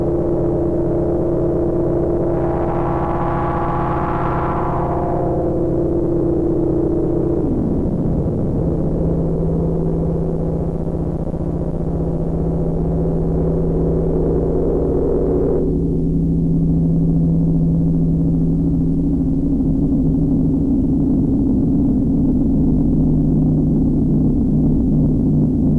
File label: drone & delay